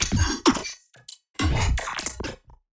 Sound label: Nice Glitch